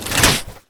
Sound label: crank; deepimpact